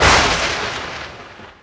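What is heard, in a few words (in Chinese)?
电闪雷鸣; 红色警戒2; 超级武器音效; 天气控制仪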